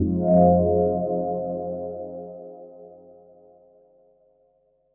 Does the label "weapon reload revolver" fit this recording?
No